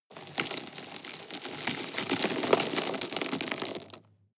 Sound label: drop rock debris medium